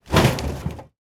car impact

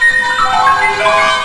CRYSTAL

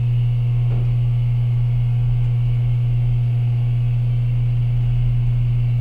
elevator hum inside

